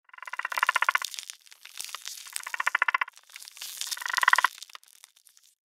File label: mirelurk idle; npc